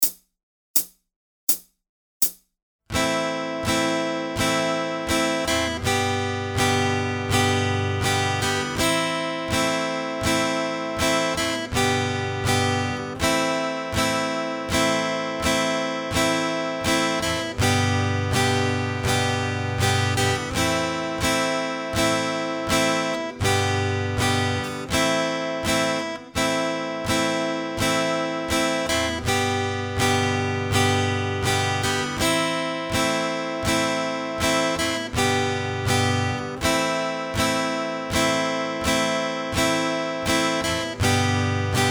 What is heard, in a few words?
Chords SLOW, guitar, no fingerstyle; Drunkin Sailor; 1 MINUTE MELODIES STARTER